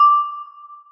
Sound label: celesta, programmable speaker